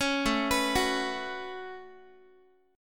A 6th Add 9th A down Guitar; A6add9 arpeggiated; 16,17,14,16,x,x

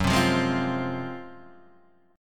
F Minor F sharp, Fm/F# chord; strummed; JGuitar

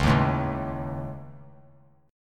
C sharp Suspended 2nd Sharp 5th C sharp; C#sus2#5; strummed; JGuitar

